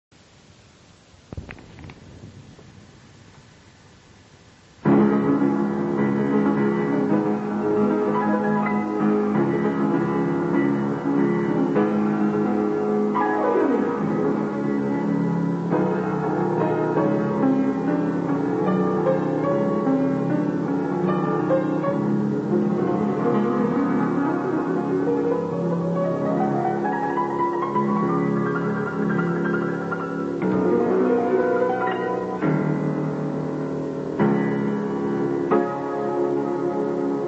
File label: 3rd mvt concerto for piano